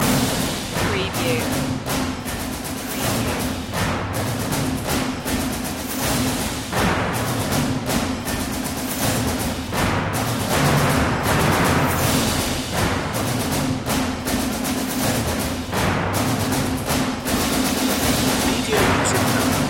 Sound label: percussion only